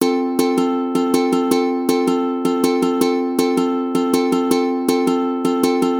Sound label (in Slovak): Rytmy ukulele foxtrot